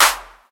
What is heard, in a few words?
Clap E Key, clap sound tuned to the E note. Loudest frequency: 4055Hz; percussion; single hits one shots